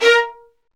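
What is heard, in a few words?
strings violins; L-CD702; Vlns Marc4-5; Vls Marc4 x8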